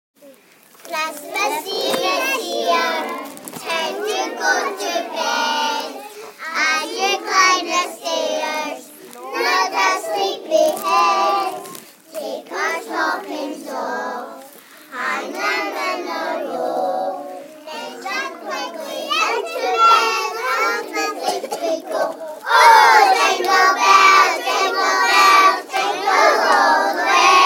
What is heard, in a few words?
jingle bells